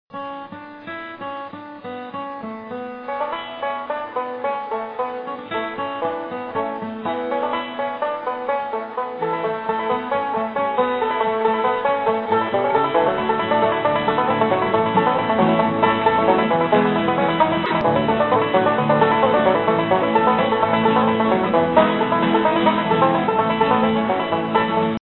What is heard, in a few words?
banjo; ringtones